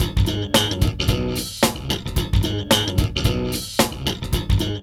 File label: LOOP